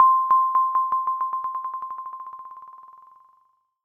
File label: Flip